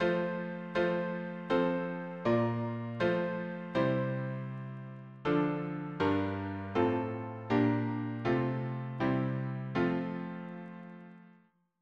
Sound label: harmony piano